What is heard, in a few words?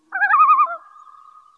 LOON; anito; ambient